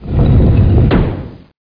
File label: DR CLOSE; Magic - An Insider's View